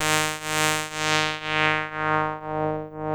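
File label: synth, 162 BPM, Drum & Bass; Ueberschall; Drum & Bass Resonance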